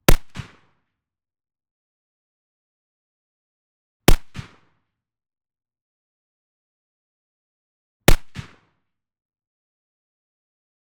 Remington 700 7.62x51mm 1m right clean Single shots; MKH8040